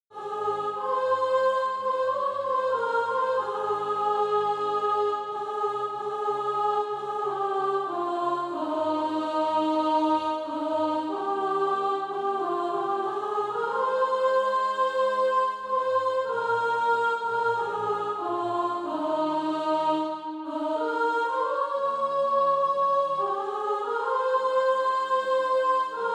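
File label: soprano, Practice Music; SATB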